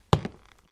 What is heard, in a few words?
step; brainrot; noobini hambuggerini; noobini burger